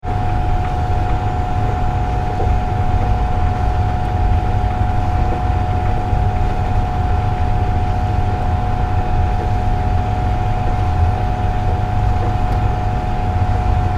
Pump Running, Central heating boiler pump - domestic; Machinery; Tom Hutchings